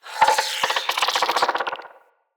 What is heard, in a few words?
Root Spider Vocal Chatter; Animals; Spiders; Vocals